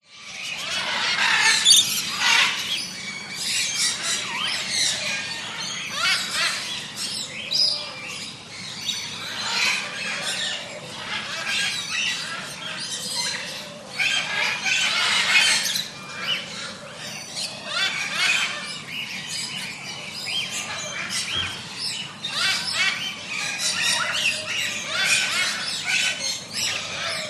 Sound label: animals birds tropical large avery amb parrots parakeets doves; zapsplat